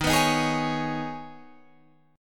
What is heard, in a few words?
D sharp 7th Suspended 4th Sharp 5th, Guitar, Drop D